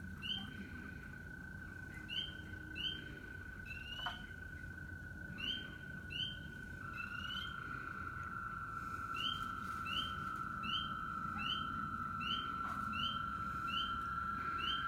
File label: Frog chorus